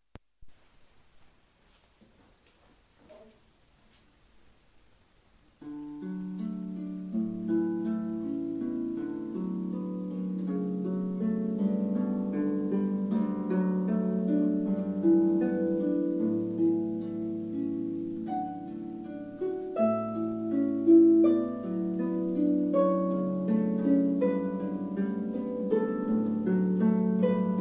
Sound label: wedding harpist; pachelbel canon in D